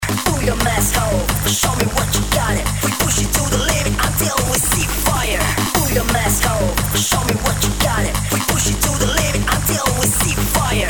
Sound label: BASSDROPS; MIRAKLE ONLY BASES 5